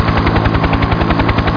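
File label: helicopter; RETURN FIRE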